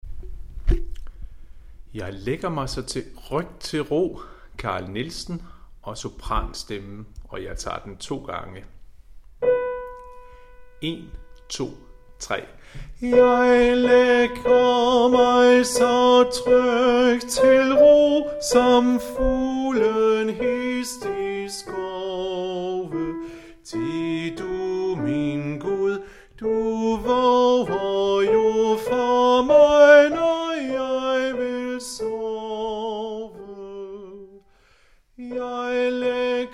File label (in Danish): Jeg lægger mig så trygt til ro- sopran; harlev koret